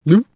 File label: gulp